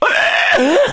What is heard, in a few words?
odd, infected die; left4dead; Source engine